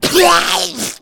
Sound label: smoker spot prey